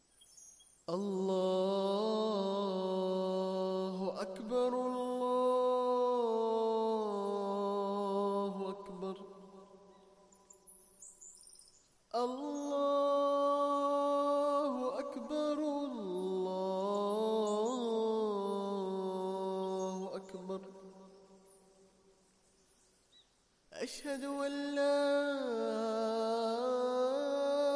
Athan Alarm

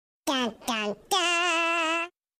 Tan Taan Taaaaaaaaan

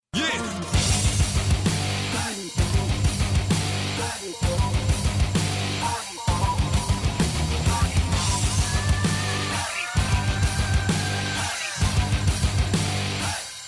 Guitar Beat

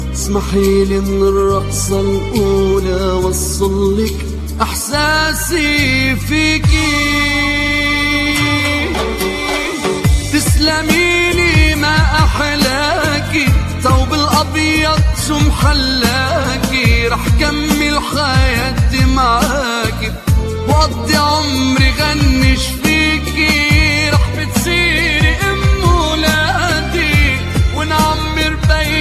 Arabic

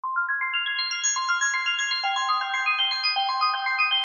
Future Ringtone

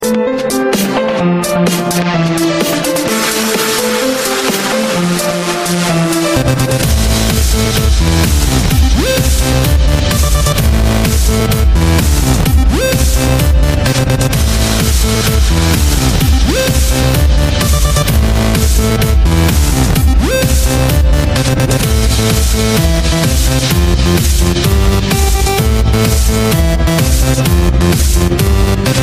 Electro

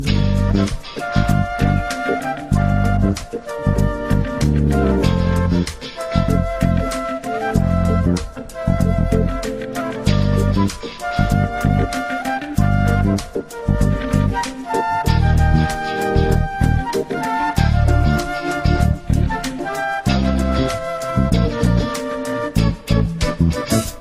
Mysterious flute

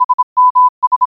Morse Code SMS 1kHz

